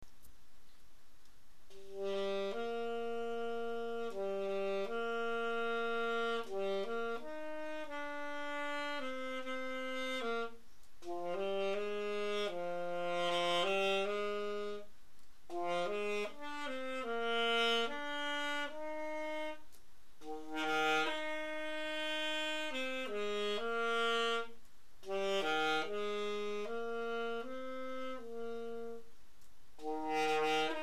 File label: lullaby jazz, sax